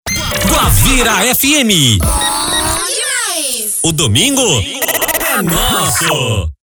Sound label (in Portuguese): vinheta